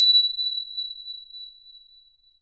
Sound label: Bells Chimes; NorthStar - Global Instruments VOL-2